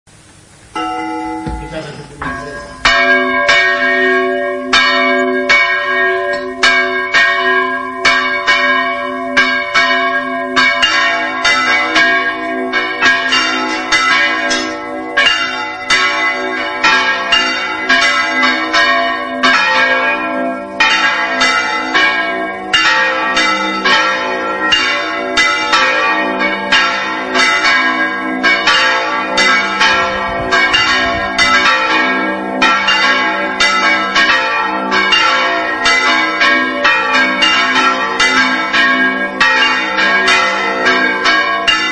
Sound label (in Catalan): bandeo, campanars tocs